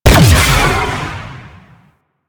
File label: Missile Swarm Fire; Warframe; Corpus; Corpus Railjack; Spec Ops Arachnoid